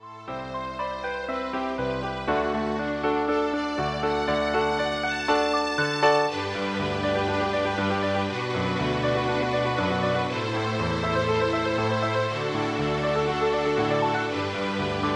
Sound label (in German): Jazz-Ballade